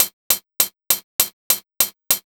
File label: DRM SN; USB Soundscan vol.03; AKAI